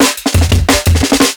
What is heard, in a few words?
175 Drum Fill, JungleBreaks; JungleBreaks24